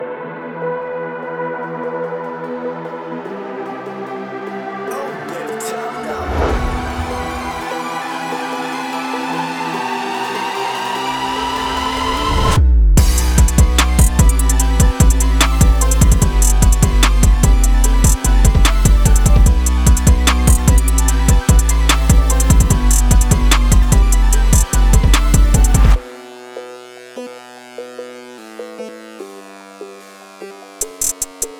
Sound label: beat rage phonk; trap type beat